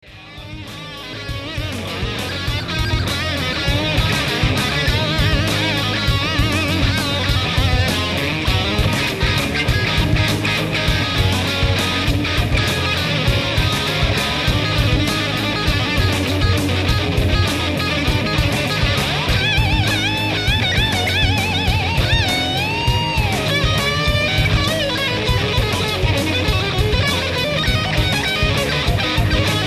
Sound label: gravity solo